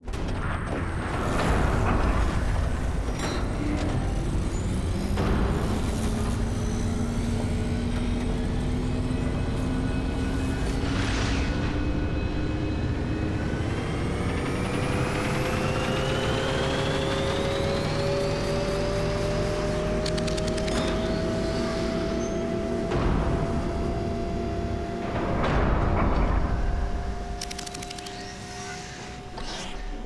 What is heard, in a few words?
ship colossus open; ships; Stellaris; toxoids